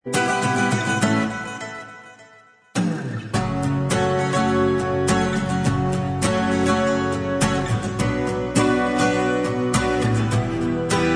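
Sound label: acoustic version, instrumental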